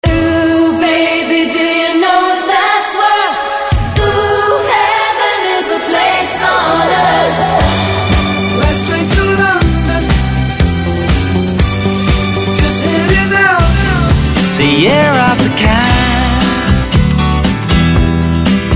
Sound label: classic pop 70 80